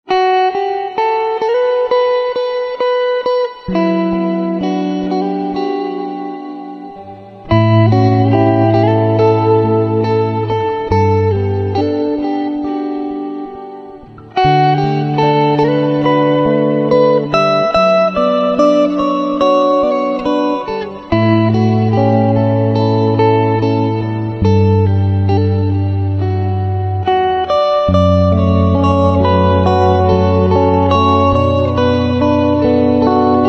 Guitar Slow

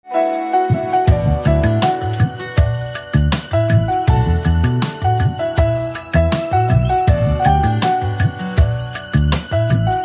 Piano

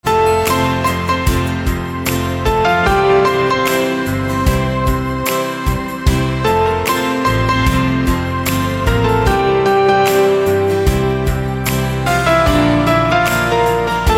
Piano Instrumental Ringtone

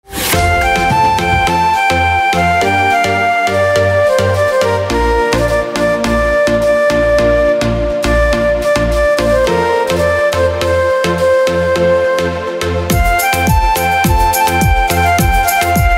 Organ Instrumental Ringtone

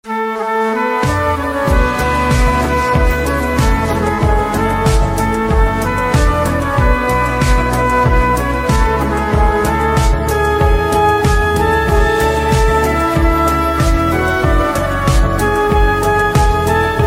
Organ Instrumental Ringtone

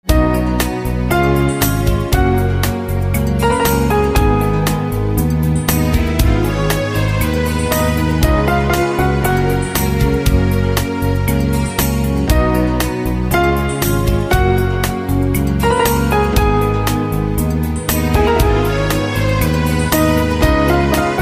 Piano, instrumental ringtone

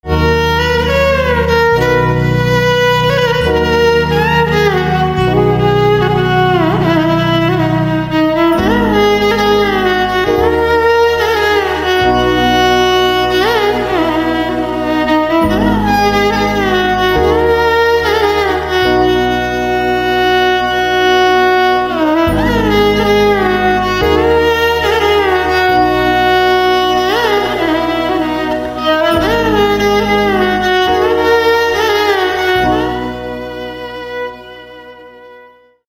Violin

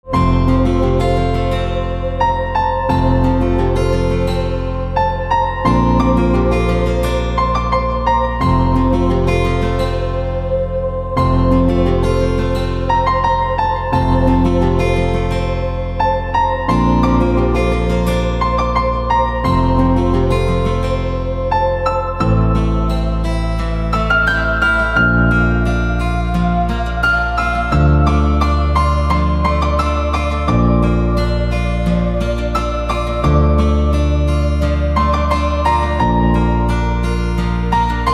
Piano, instrumental ringtones

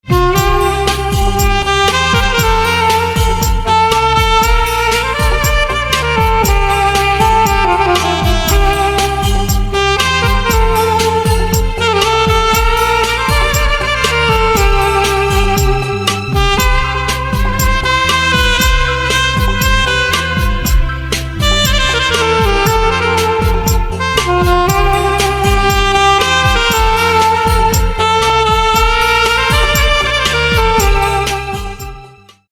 Saxophone

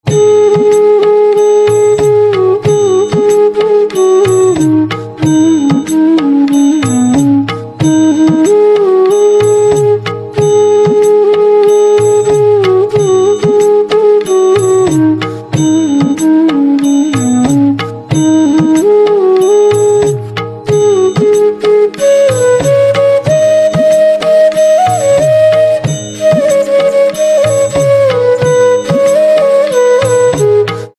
Flute Instrumental Ringtone; traditonal devotional

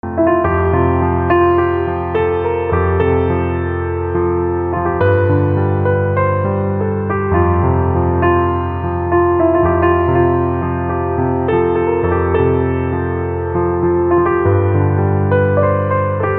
Piano

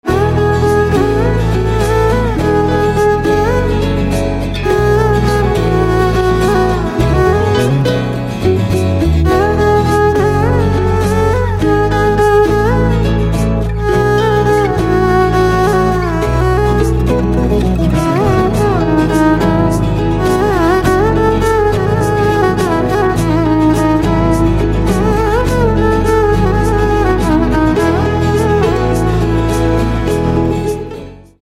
Violin

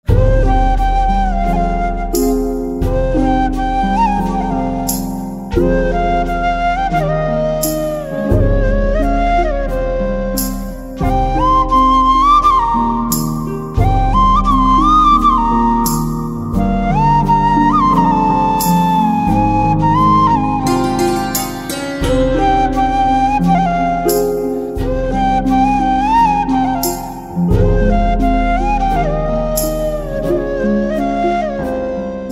Flute, instrumental ringtones

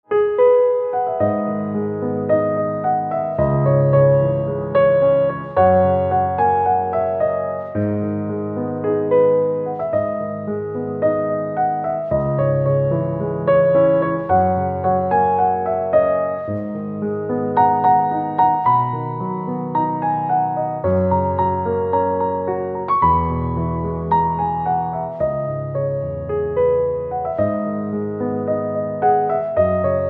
Piano Silent

